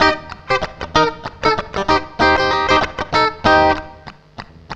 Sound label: FUNK, FUNKY GTR